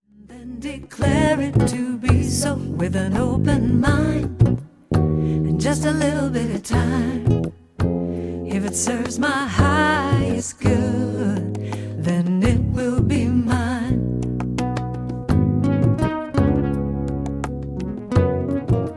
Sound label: Receiving Chant